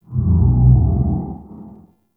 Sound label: Vocal Groan; Ambience; Bromius